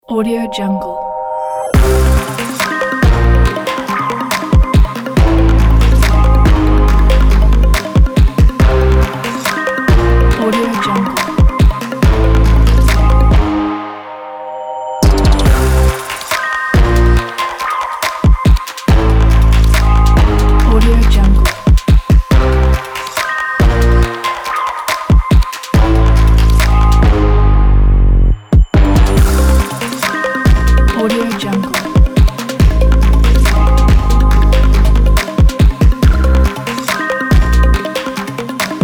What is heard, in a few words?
fashion bass percussion beat; brainmire